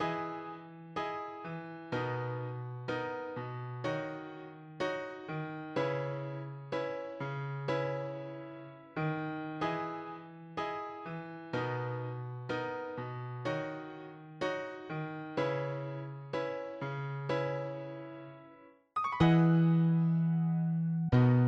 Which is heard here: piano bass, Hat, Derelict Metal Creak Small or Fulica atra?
piano bass